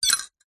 household teaspoon set down in mug of hot tea or coffee